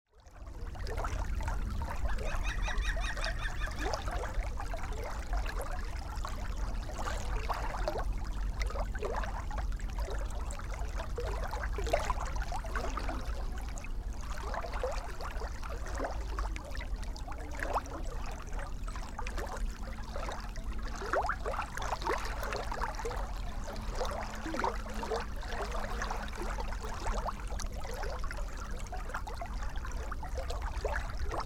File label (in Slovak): velka okruzna rieka; partizanske